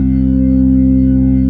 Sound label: B3 C 1; Best Service; Advanced Media Trax Modern Composer; B3 SMALL2